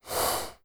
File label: Goral Idle; Seer; GamigoNA